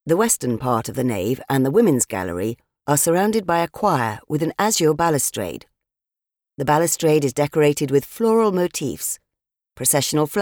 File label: Music choir; EN